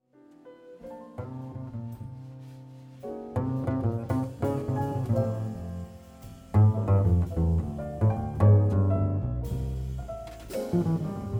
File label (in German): Violinkonzert 2. Satz